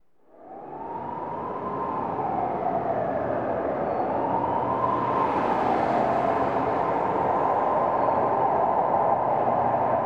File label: City Traffic Police Siren